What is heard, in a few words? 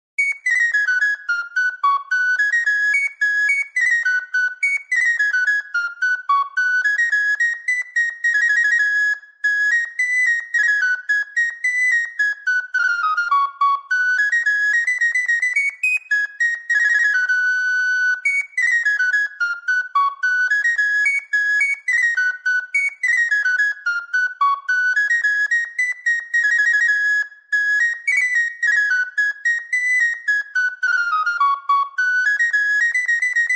virtual recorder english flute vst plugin; The Bird Fancyer's Delight; John Walsh; Syntheway